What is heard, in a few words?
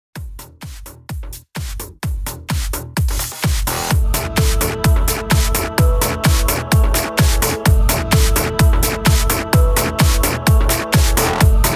128 BPM